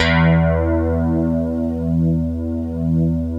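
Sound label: STRAT E2, clean guitar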